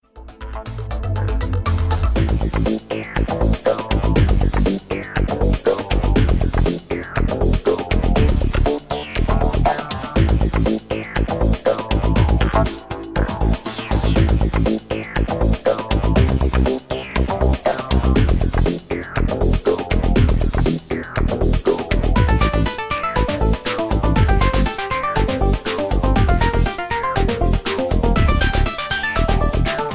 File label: Electro